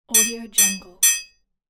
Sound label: crafting metal item